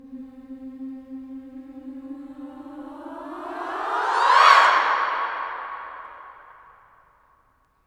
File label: FEM SWOOP, SWOOPING